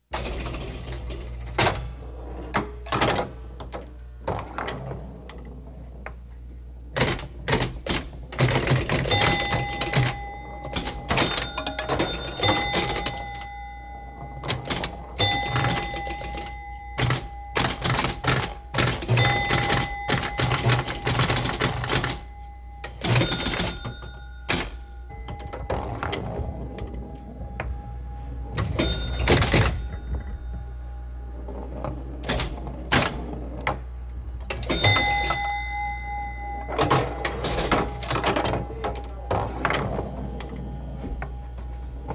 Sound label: Arcade pinball